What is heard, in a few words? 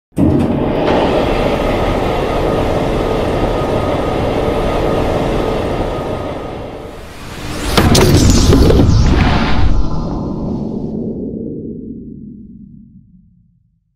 conveyor belt